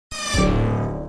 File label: coin insert